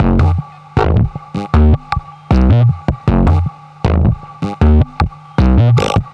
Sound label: Modular Loop, Modular Percussion